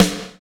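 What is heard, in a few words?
snare ragga; AMG - Now CD-ROM (Roland); Soun'Bwoy!; Ragga Samples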